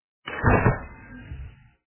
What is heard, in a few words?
door; among us